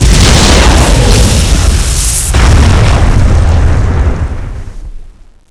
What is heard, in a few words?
mortar hit